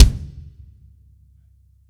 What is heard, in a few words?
normal hit whistle